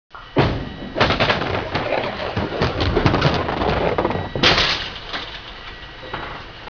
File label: bowl; 8bit